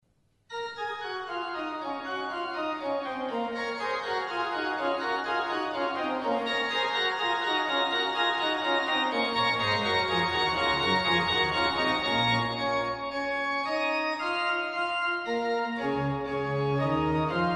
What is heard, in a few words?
allegro